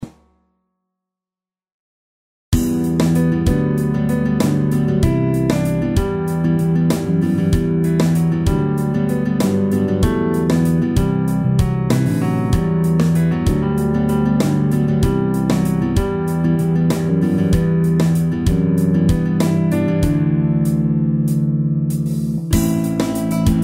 Increased Emotion

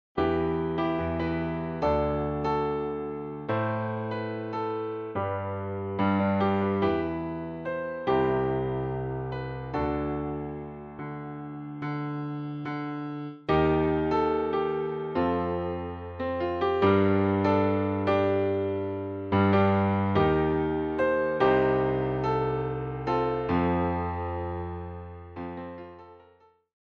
Good Transition

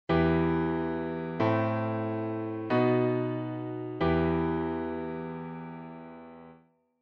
e harmonic minor sequence

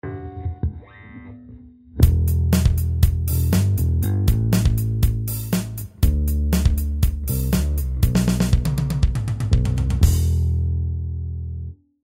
bass guitar added sustain full

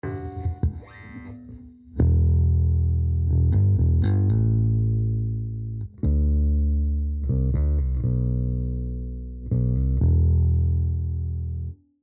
bass guitar added sustain solo

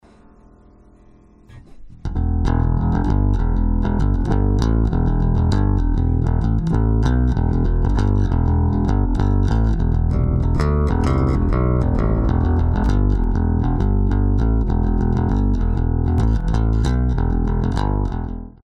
bassline compressed gain

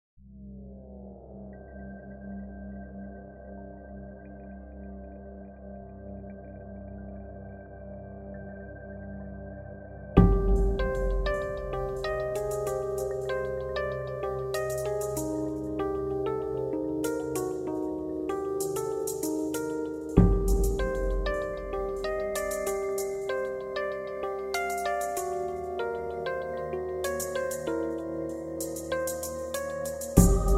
Choir And Drum; Frozen

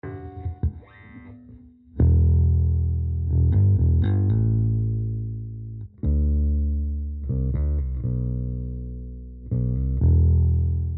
bass guitar no sustain solo

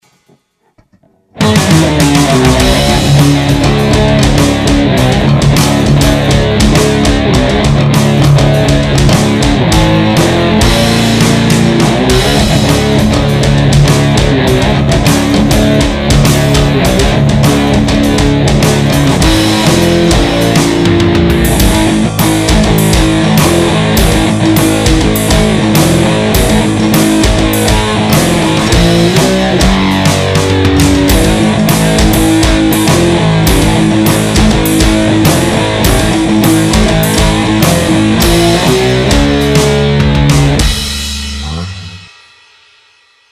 Riff Test

